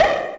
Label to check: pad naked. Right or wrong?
Wrong